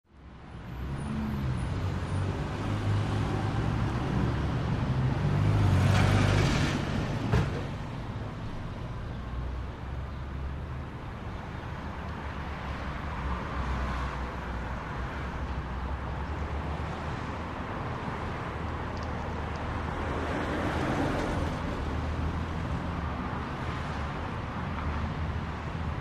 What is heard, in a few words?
Ambience: Medium City Traffic with Various Vehicle Movement And Birds. No Walla.